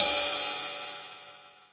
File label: Ride; osu